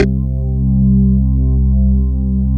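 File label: amp organ C2; Big Fish Audio; Synth City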